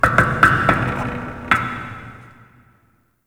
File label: Cargo Knocks; Ambience; Props; Warframe; The New War; Zariman